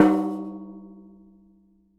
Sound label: Strike, Washing Machine Drum Rim